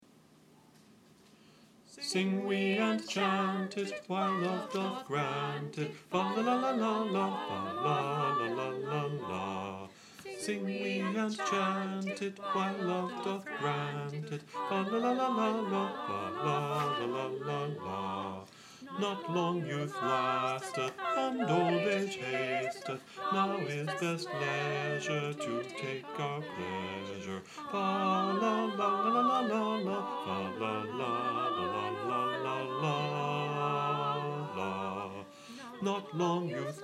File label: bass